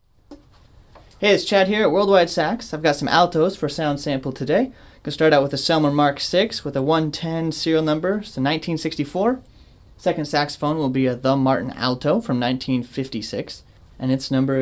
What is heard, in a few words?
altos; TMA aristo